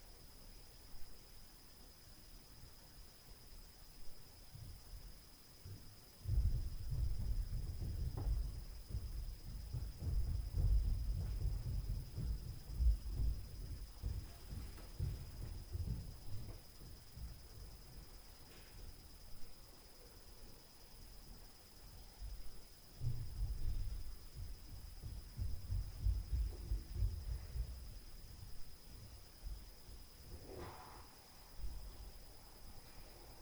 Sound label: STAIRS NOISE; Night Noise